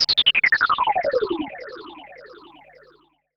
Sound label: Virus Noise